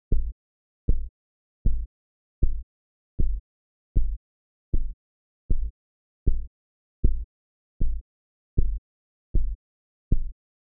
pulses

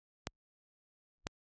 pink Imp

